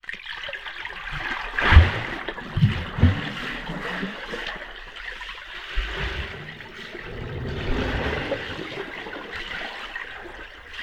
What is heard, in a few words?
sport swimmer underwater movements splashing around